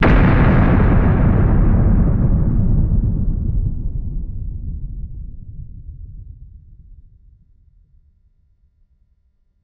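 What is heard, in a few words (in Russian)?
protyazhnoe ekho, zvuki atomnogo vzryva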